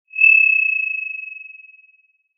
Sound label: Sound of an owl